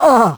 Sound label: hurt; Crypt of the NecroDancer